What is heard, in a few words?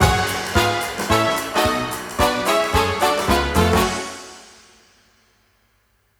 Win